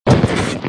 New Mail Notification; Motorcross Madness 2

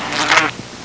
dead mosquito, insects

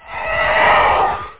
cyclone; WormWars

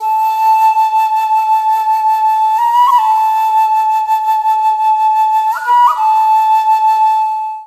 FLUTE ETHNO 125

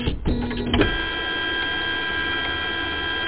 fax; Japan; Internet 1996 World Exposition; NTT

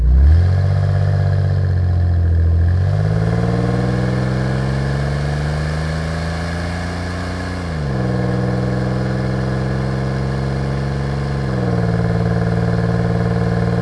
Car pull away; UrbanChaos

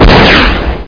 MISSILE

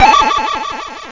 signal

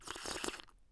Sip Tea; Civilization4

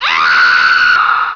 Scream; Internet 1996 World Exposition; Panasonic; funcity; paris

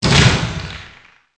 hit revrb; planecr

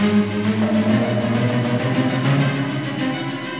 String loop; The Official Guide to Babylon 5

